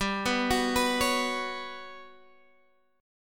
G Major 7th Flat 5th G, Guitar Standard; arpeggiated

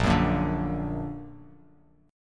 Bb Augmented Bb; strummed; JGuitar

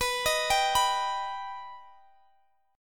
G Augmented

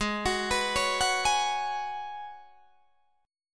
G sharp Minor 11th G sharp Guitar DADGAD, chord

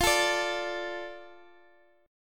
D 5th F; D5/F; strummed; JGuitar

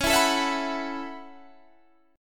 A 6th Add 9th C sharp, guitar

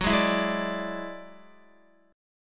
Gb 7th Suspended 2nd Sharp 5th, Guitar; strummed; JGuitar

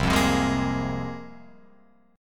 C 7th Suspended 4th Sharp 5th C Guitar Open F; C7sus4#5 strummed

